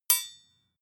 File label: sword hit short ring